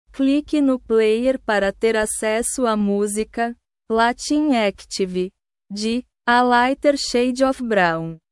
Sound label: latin active